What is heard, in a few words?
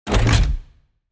Action adventure game menu ui unlock item